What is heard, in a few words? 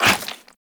Run Goo, Footsteps; Warframe; Characters; Tenno